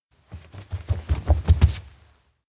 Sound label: steps outdoor janitor room tatami run up; rikka; anemoi